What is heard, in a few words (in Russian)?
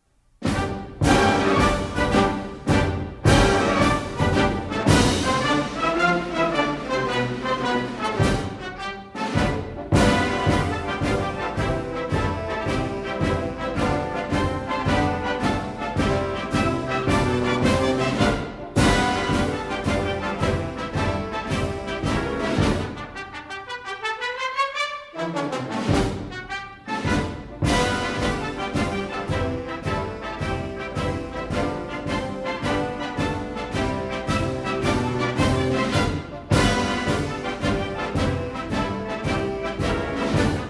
stroevaya muzyka